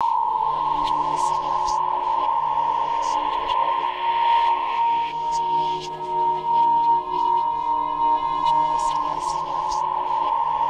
WHISPER TON; Distored Reality

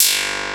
ELECTRONICA; Pro-Rec - Synthsonic; Z1 TECHNO

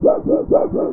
Sheet Wobble, Metal; Sonic Foundry (Sony Creative Software) - Ambient Atmospheres & Rhythms; Ambient-Metals

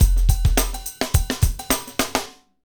Hip Hop, Drumscapes Roland; Northstar

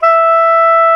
SAX SOP MP E, Soprano Sh; Roland LCDP07 Super Sax

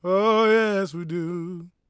GOSPEL MALE; Spectrasonics Vocal Planet

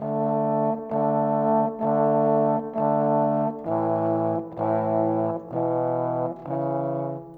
Horns, Orchestral; Sonic Foundry (Sony Creative Software) - Orchestral VOL-2; Modern 38

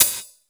MONDO HAT, METALIC DRM; Crypton - DrumBase5000; METALIC DRM2

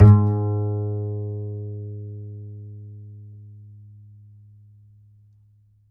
G, Upright Acoustic Bass; Larry Seyer; SWITCHABLE POSITIONS

